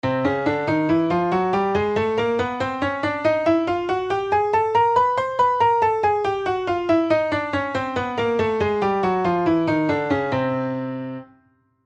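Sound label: Chromatic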